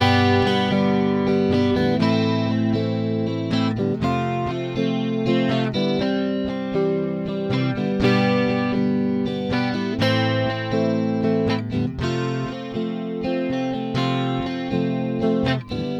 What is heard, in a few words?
Guitar without Magic Stereo